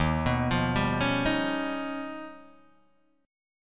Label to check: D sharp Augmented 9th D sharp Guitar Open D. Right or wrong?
Right